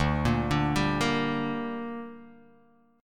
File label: D Suspended 4th Sharp 5th